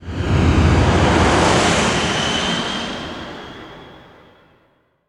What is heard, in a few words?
NOIS; XXL 800 Production Effects